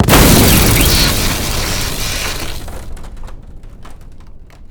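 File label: lightning, ships combat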